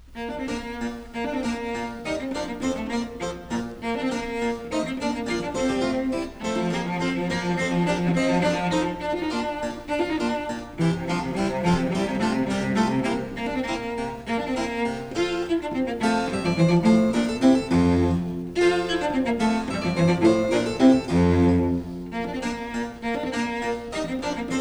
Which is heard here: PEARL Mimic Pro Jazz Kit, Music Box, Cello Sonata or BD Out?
Cello Sonata